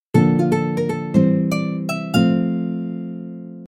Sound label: Jingle